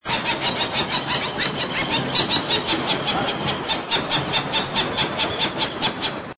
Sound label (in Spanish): voz jacana